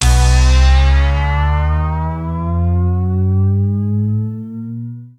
Bonus Wheel Symbol Anticipation; ClubFusion; Showtime Classic

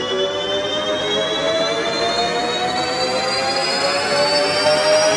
Bonus Wheel Symbol Anticipation; JackpotDarts; ClubFusion

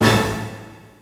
Bonus Wheel Symbol; ClubFusion; Showtime Classic

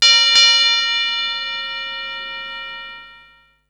Bell; LuckyPunch; G-Star

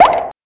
Button; World Of Sport Quiz

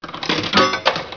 Start Press; MexicanaChillitime; ClubFusion

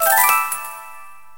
Vibe Win Transfer Stop; ShowtimePOG

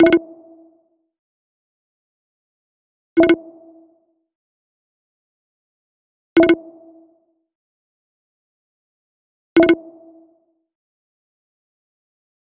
Insert Credit Loop; ClubFusion; FruitChutes

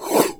Card Flip; MexicanaChillitime; ClubFusion

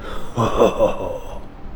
hohohoho; OverTheBrink

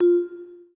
Button; RacingRiches

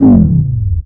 Time Up; World Masters Pro

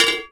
Dice Spin Stop; Chilli Run; MexicanaChillitime; ClubFusion

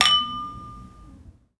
glass; OverTheBrink

